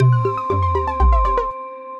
Synth Keys; Topping

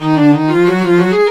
092 South HipHop Lo Strings Ebm

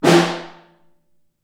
Snare Far

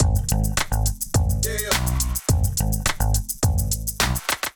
Monuments Kit Groove 105 bpm; MIDI Clips; Ableton; Factory Packs; Beat Tools

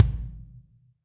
Kick Felt Low Big Punchy; Drum Hits; Ableton; Factory Packs; Session Drums Club; Standard Hits

